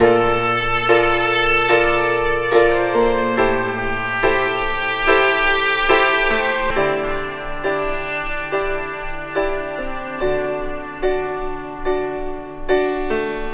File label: piano, Loops Sad